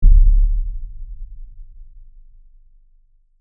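Impact Low Frequency Effects Monster, Footstep, Tremor; Impact, Lfe, Monster, Footstep, Tremor; Horror; Blastwave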